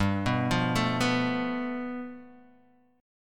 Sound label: G 9th, Guitar Open D